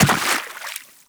Step Water, footsteps; Warframe; Teshin

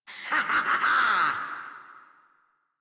Laugh; Warframe; Grineer Catbrow Handler

